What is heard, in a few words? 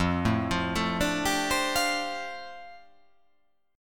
F 6th Add 9th F down, chord